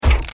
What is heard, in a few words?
Spring run step; gamerip; the joy of creation halloween